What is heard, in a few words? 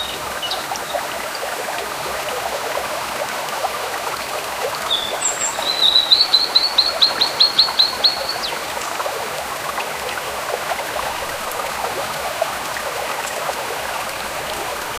water flowing birds voice, birds chirping